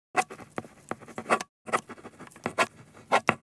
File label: Ballpoint pen writing on paper